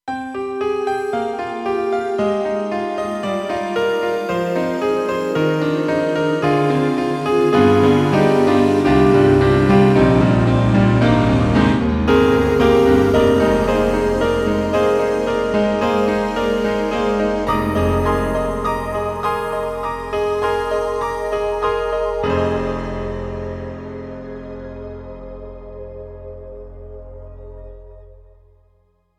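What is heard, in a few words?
KORG M3 XPanded Combination, piano; Winter Walk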